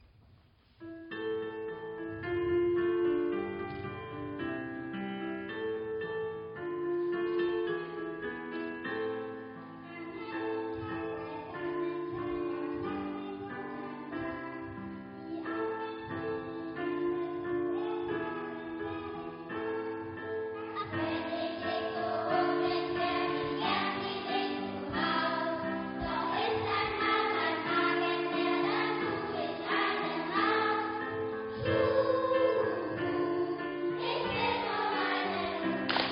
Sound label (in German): Lied der Eule